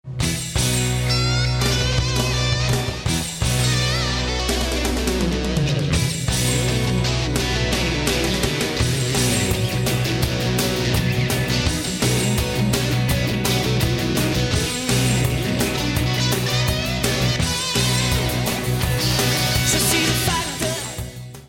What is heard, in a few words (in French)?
guitare; la boite a rythme